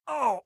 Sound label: hit, damage; minecraft